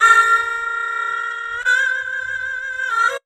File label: VIOLIN FX